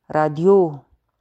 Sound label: radio